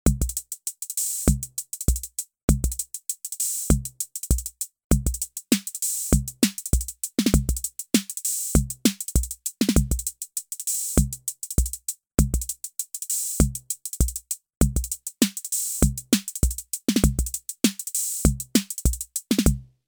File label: Beat